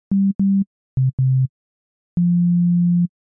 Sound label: radiooo jingle start; Reaper; toncheck